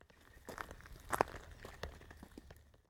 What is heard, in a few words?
Crunchy Steps